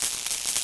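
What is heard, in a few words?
electric; PC Gamer IT CD 49; UrbanChaos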